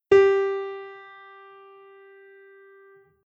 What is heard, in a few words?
soprano chromatic scale